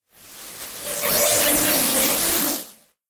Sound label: Static Blast SciFi Data Transmission Whoosh; Static Blast Data Transmission Whoosh Element; Sound Design; Static (Sound Effects:Sound Design)